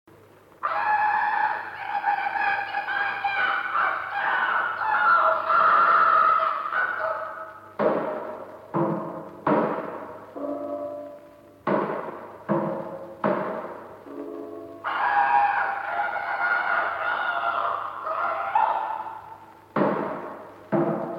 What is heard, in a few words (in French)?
bruitage et cri dans l escalier